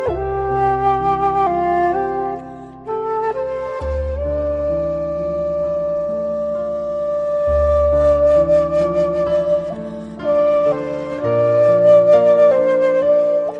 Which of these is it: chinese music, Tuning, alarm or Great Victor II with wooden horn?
chinese music